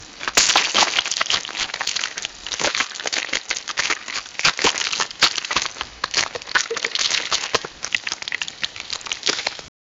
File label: big rotten pumpkins splat